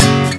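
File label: guitar string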